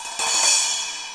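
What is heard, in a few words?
cymbal roll; drums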